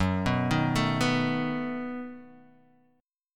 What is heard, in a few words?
B 7th Gb